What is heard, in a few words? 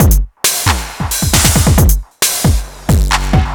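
drum loop 135, right; House; Best Service ProSamples vol.10 - House; AKAI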